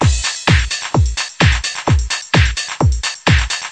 130 bpm, euro straight up, drum loops and breaks

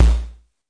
noise bd, Korg ER-1

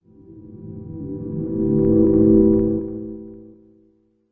gentle reversed crackle 5th synth; Pads

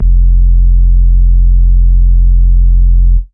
sustained 3 octave, Jungle Bass

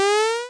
8bit kit powerup; LO FI

